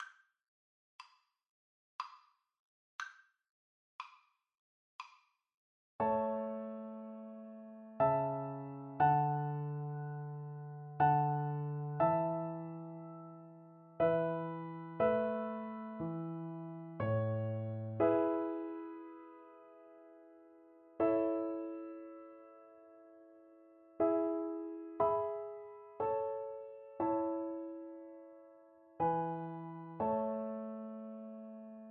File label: minuet, Viola